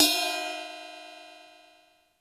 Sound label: RIDE CYMBL; Crypton - DrumBase5000